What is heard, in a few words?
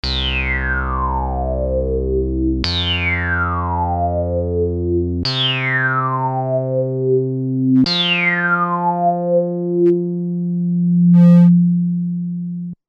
Juno Filter Sw